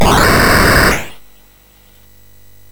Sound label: Dragons Den, MOS6581R3